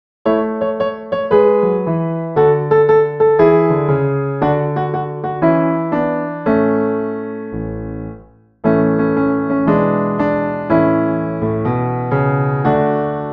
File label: backing track